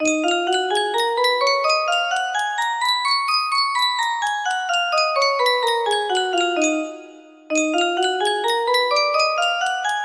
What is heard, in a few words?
d sharp minor, melody